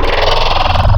Monster; Synthetic's Serum Collection

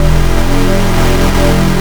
Good For Chords, Wavetables; Synthetic's Serum Collection; Cymatics - Essentials Wavetables

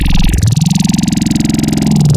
Growl Table; Synthetic's Serum Collection; Cymatics - Growl Tables

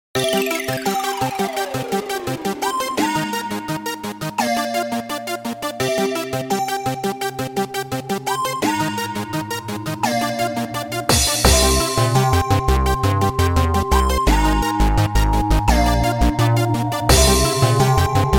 Toy Box; lolicore

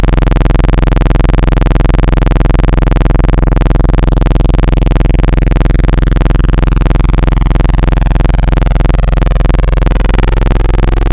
Free Run Saw; Synthetic's Serum Collection; Echo Sound Works - Modular

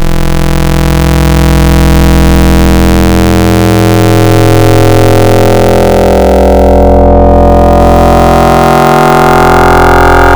Static; Synthetic's Serum Collection; Cymatics - Outbreak Wavetables

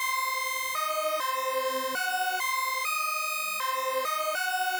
hi synth sequence; 'Go Fuck Yourself' samples; lolicore

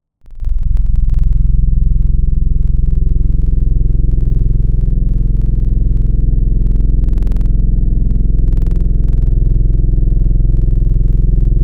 Trumpet, Wavetables; Synthetic's Serum Collection; Standalone-Music SYMPHONY Wavetables

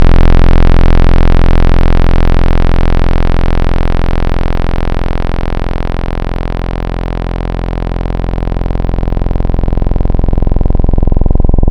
Analog Juno 60 square; Synthetic's Serum Collection; Echo Sound Works - Modular

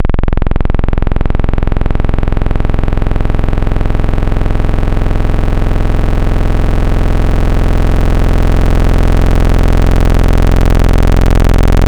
Saw Morph, Wavetables; Synthetic's Serum Collection; ESW Core Wavetables; Echo Sound Works Core Tables

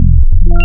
FM Freak, Digital; Synthetic's Serum Collection

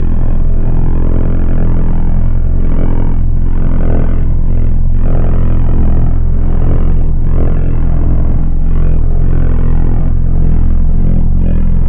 Chaos Square, Tables; Synthetic's Serum Collection; Chill Trap 2 Tables

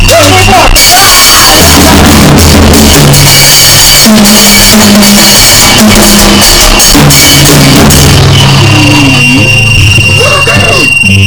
I Love Cymbals; lolicore

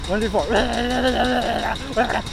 Vocals, Field Recording Grindcore Live; lolicore; In San Francisco

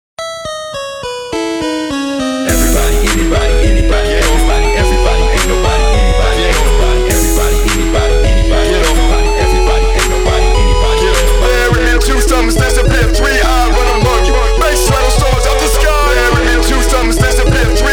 Bass Rattle

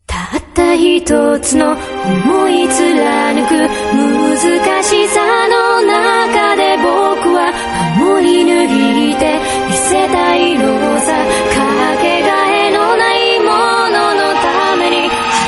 noise ensemble; triela; lolicore

